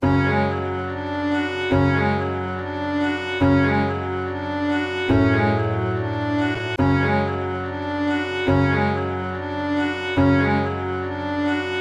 Projectile 142 BPM F# Min; Cymatics Gems Vol 7; Gems Free Teaser Pack

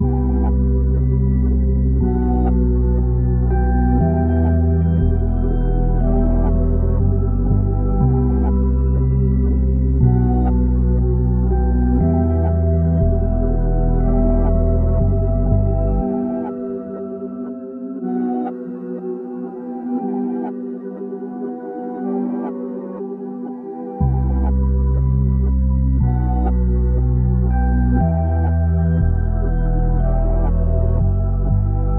120 BPM E Min, Melody Loops; Hibiki; Hip Hop

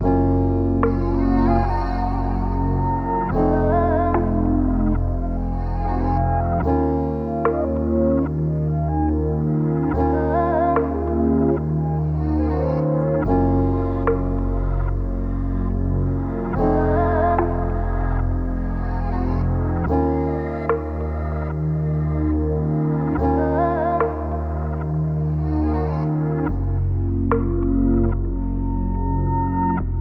145 BPM A# Min; Cymatics; Zodiac III Beta Pack; Zodiac III Production Suite

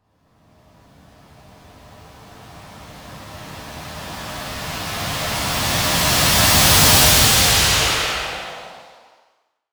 Riser

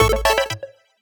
Menu FX Chiptune; Video Game; Cymatics; Video Game Sample Pack Vol 1

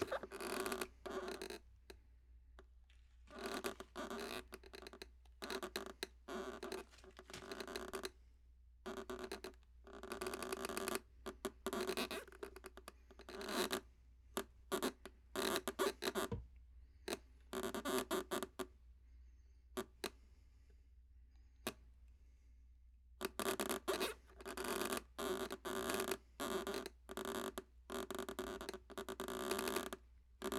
Hammock Creaking and Sway; Fairlight Sound Lib

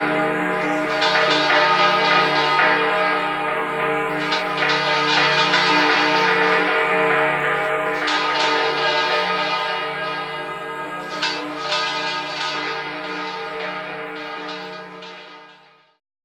Granular Broken Bells Short - Am 60Bpm; Construction Kits; Ghosthack; SkyRealm; When The Bell Tolls

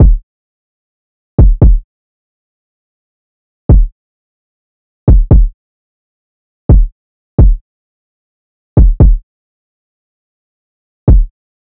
Drum Loop 130 BPM Kick, Trap; Cymatics; ANALOG EVOLUTION Beta

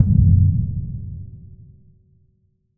Boom

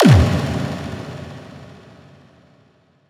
Impact Earth; One-Shots; SFX; Ghosthack; Color Bass Essentials

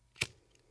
Zippo Lighter Ignite; Fairlight Sound Lib

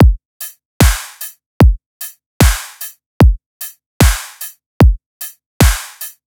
Dubstep Drum Loop Full, 150bpm; Full Drum Loops (Stem Seperated); Ghosthack; Dubstep Sound Arsenal

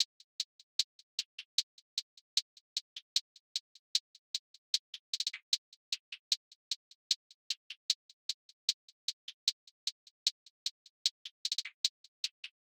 Drum Loop, 152 BPM Hihat; Cymatics; Vibes II Drum Loop Collection; For You Drum Loop

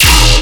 Bass Hit D#, Dubstep; Abyssal Rumble; Ghosthack; Demolition

